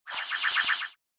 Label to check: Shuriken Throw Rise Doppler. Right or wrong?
Right